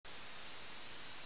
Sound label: trumpet